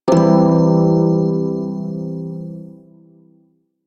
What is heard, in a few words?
Shawzin Phrygian Chord; Warframe; Prime Shawzin